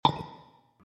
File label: beep